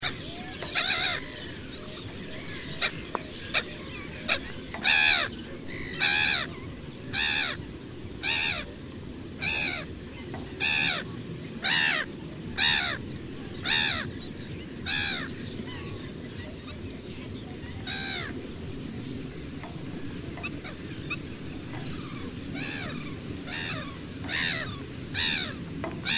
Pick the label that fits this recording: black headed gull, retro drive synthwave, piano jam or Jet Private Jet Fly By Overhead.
black headed gull